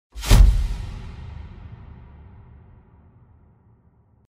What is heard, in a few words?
Impact